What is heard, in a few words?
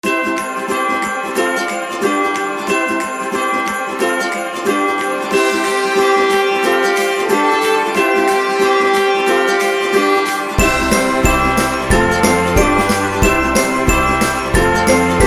Upbeat Happy Christmas